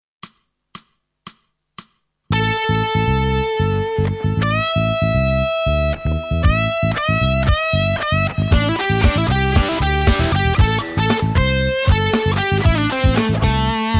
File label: Rock House Lead, Rock-Metal; The Rock Metal Book - Wim Kueter